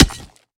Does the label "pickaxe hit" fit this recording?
Yes